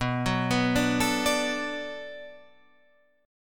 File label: D 6th B, chord